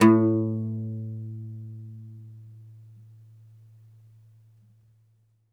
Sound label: OUD A1 -L; E-MU Producer Series Vol. 7 – Old World Instruments